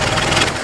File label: model t engine wheeze; laundry truck; sound effects and sound reinforcement